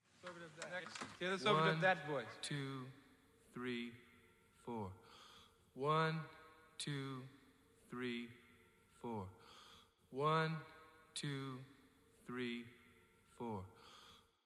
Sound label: Brian stem